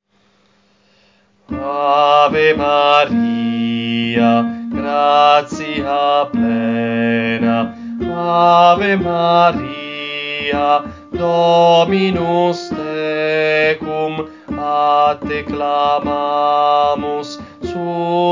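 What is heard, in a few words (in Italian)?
Contralti, coro; Coro Isola del Giglio; Ave Maris Stella Rota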